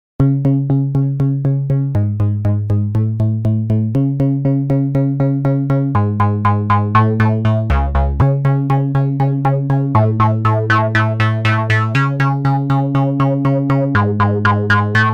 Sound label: Mini Brute V Walking Metalizer